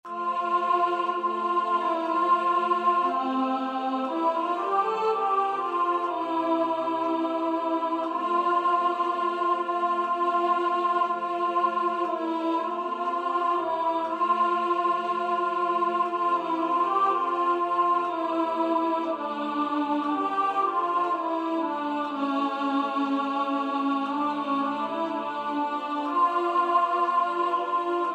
Alto Voice un ton plus bas

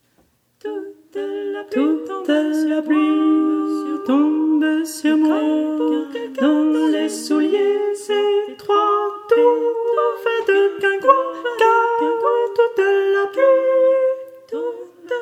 tenor basses

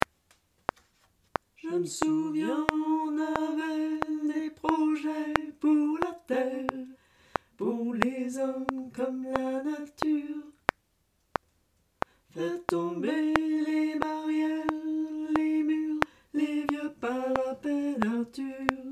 tutti

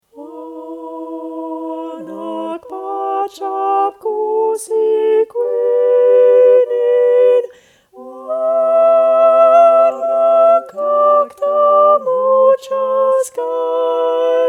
sopranes; Hanacpachap Cussi Cuinin

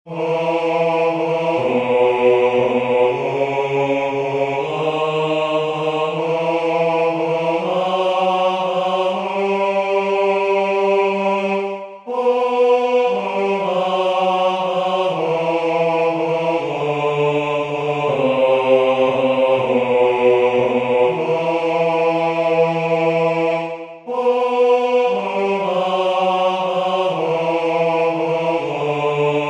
hommes; Berceuse Cosaque

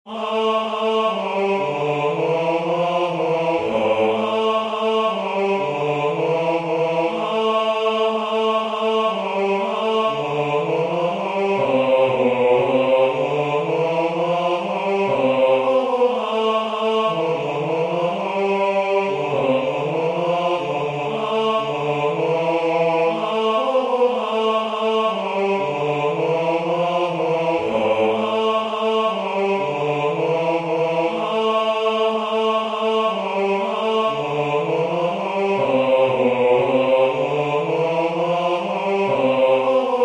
hommes; En gardant ma bergerie

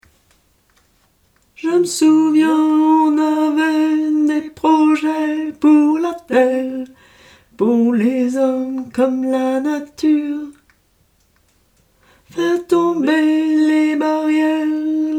basses

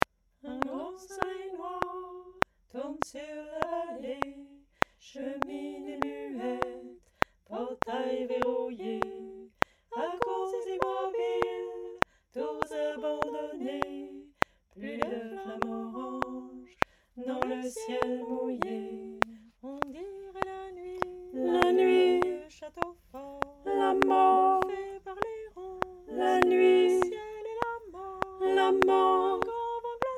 basses

